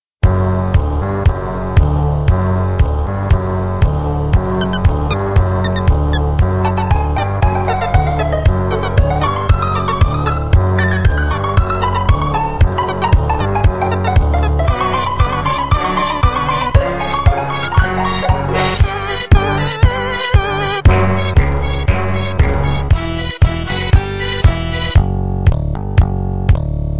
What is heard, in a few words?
battle music; css